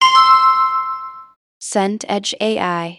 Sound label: ui purchase confirm